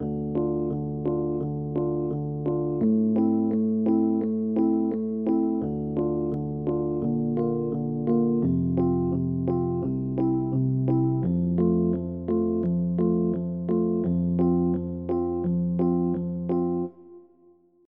Electronic Sonata

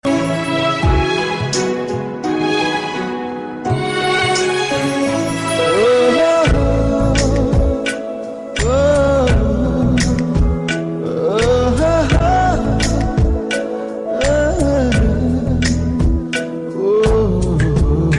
Sad Love

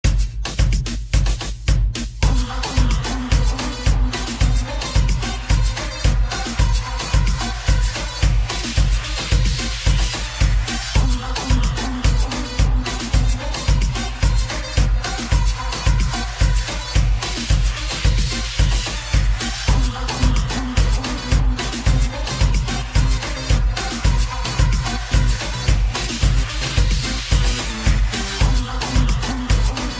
Awesome Ringtone

